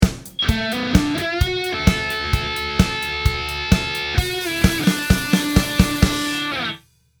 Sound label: little riff